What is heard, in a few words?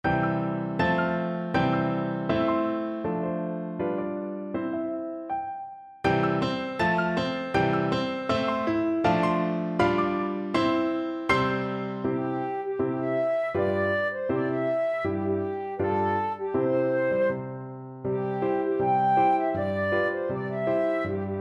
comin thro the rye flute